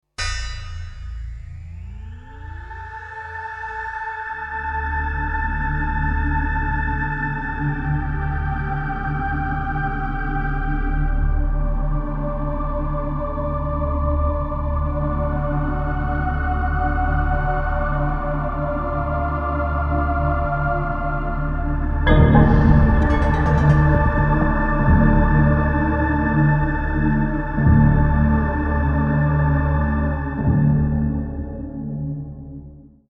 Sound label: creepy